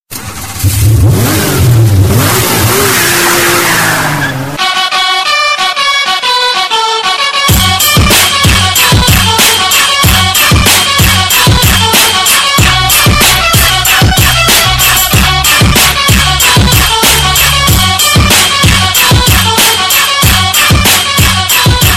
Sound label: Theme Ringtone; Dhoom3